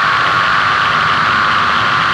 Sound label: Kaos FX, Ambient Grooves